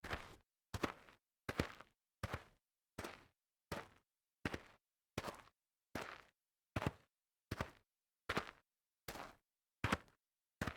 walk on dirt series, foley

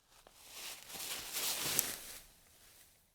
slide on leaves

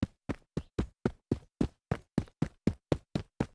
run in dirt

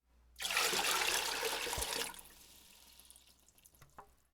pour into metal bucket

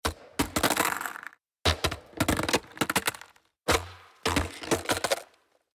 skateboard trick and crash

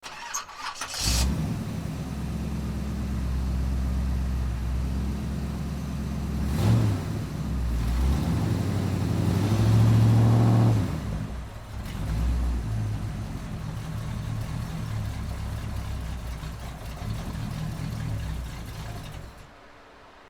muscle car start idle and stall

